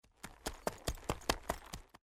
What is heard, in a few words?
Horse; Age of Empires III